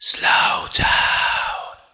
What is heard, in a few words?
slowdown low